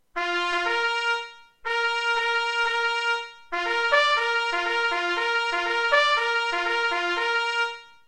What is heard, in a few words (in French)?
aux malades sonnerie clairon